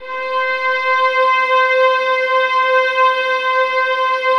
VIOLINS, Synclavier Strings; ILIO

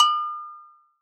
Agogo Hi; Roland SPD-S

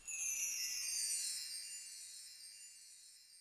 percussion, Millenium MPS-400

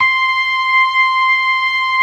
LOW MAN, LOWER MANUAL, Organ

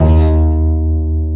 ContraBass Balalaika D#2; Planet Earth; Digital Sound Factory Planet Earth

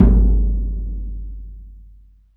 CEREMONY, LRG DRUMS, Africa; Spectrasonics; Heart of Africa

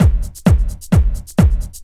drum 130 straight; Techno Trance Essentials

